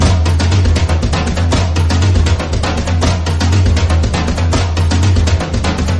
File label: drums; horde